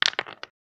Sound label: stones; RPG